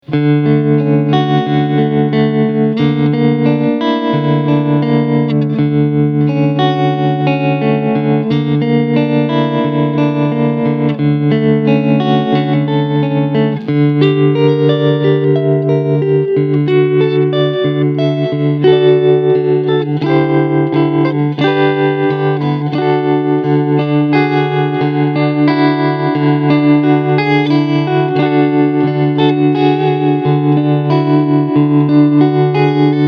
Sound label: Soft Tremolo